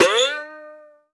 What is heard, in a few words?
Cartoon Twangs High; Random Sounds: Cartoon Twangs